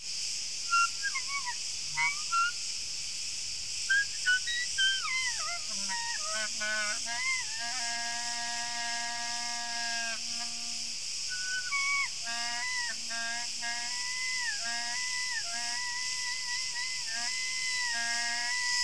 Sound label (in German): Grillen Frei Rau Stand Hoch Tief Ton Spiel